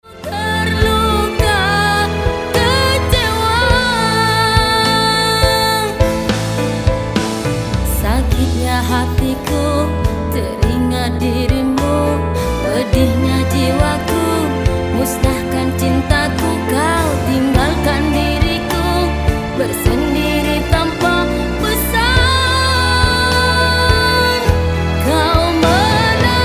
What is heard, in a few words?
rock balada